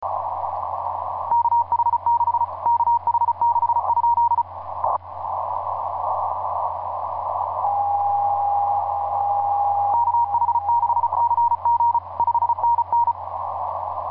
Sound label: 30m cw; k4t; kh6cg